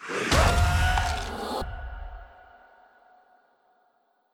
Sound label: Magus Fear